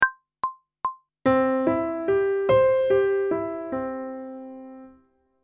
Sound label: Major Arpeggio one octave ascending and descending, pop vocals; grade 8; Technical Work